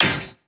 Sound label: frag physics bounce metalgrate hard; gmod; viper